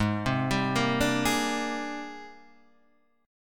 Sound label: Ab Major Sharp 11th Ab